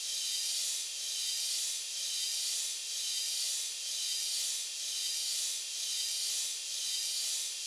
lames

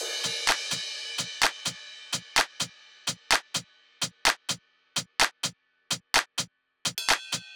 beat

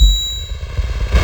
bleep noise; drums pads; noises

